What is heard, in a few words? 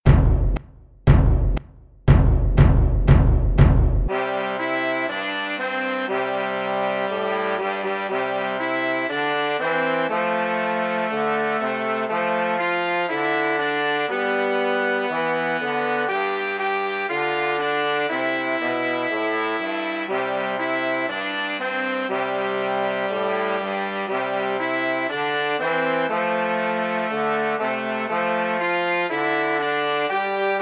Jingle Bells